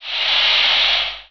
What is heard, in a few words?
attack, bullsquid